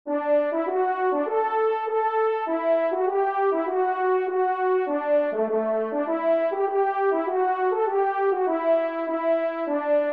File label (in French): Tambourin Do Maj; 4e Tambourin; Corrette